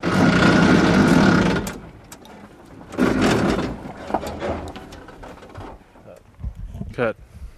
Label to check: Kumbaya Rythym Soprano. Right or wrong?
Wrong